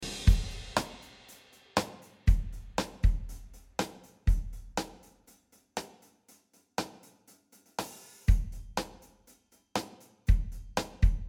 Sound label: Drums